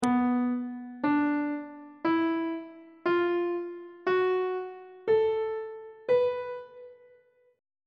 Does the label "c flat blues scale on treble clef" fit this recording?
Yes